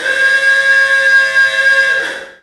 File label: Dance Mega Synth; Best Service; 90 s Sample CDs